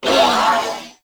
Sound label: Purgatory Warrior Death; Warframe